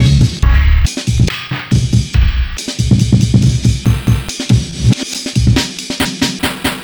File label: Amen Breaker 140Bpm; vst fx bundle